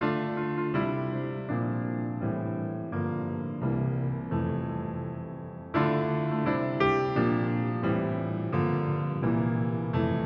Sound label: late piano